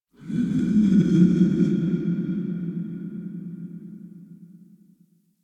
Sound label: Creature Distant Low; Ambience; Misc Outdoor; Warframe; Infested Eris